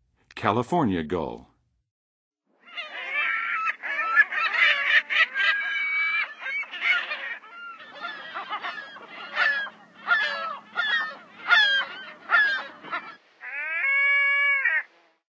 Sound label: California Gull; North America; Western USA; Western USA Songbirds